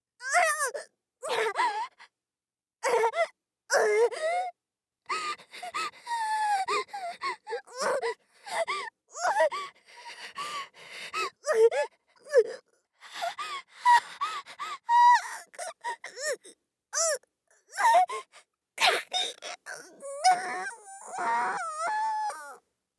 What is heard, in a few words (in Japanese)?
叩きながら, ASMR; 効果音なし